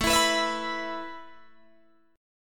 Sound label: A Suspended 2nd A Guitar DADGAD